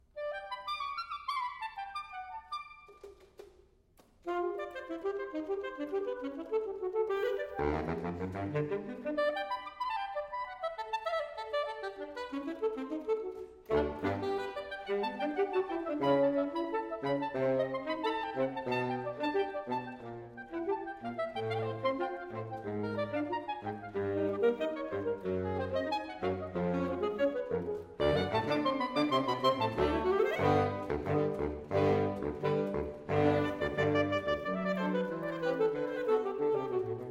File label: Saxophone Quartet